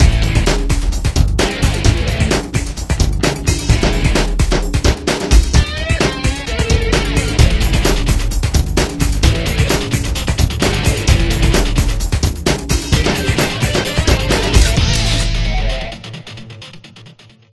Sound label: Cheerleaders Dance Theme; kurt warners arena football unleashed ps1 gamerip 2000